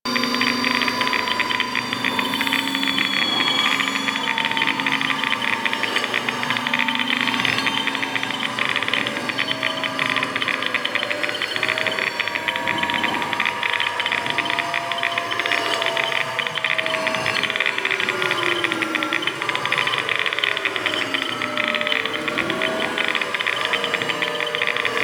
Fusion Pillar Loop; Warframe; Warframes; Qorvex

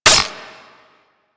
Mech, Weapons Magnum; Warframe; Tenno; Magnus Prime

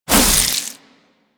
Inf Boomerang Fast Whoosh B; Warframe; Weapons; Infested

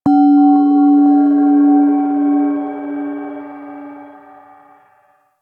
Crp Ship Temple Mid Bells C; Ambience; Warframe; Corpus Ship Remaster

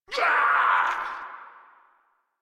Death, Grineer Male; Warframe

